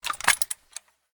wpn gbl plr inspect settle; viper

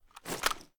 raise first end, ar; weapons; viper

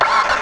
startup, vehicles; tdmcars; gtav

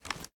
reload empty xmag start; gmod

